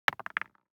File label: DICE on Neoprene, Throw and Roll, Mini, 1 One Die; Samples; ArticulatedSounds; DICE Sfx Party (Extended)